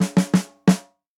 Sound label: Enemy Turn